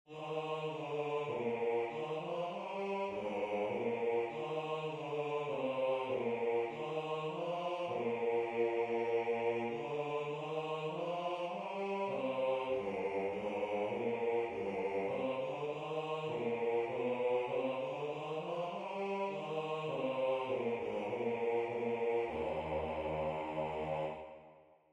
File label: Bass